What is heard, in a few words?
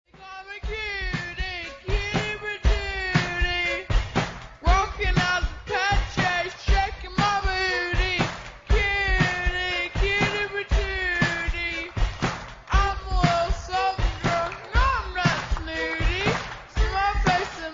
drunken slurring dwarf sings